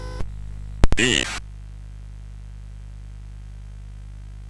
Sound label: sid CSG8580R5